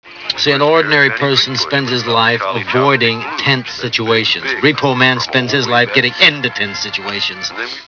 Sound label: tense